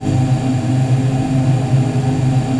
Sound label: VOICE PAD; Best Service; XX Large; Pads